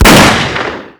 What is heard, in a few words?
Pistol shot short; Foley